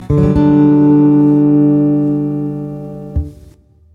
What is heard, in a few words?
d maj chords x5403x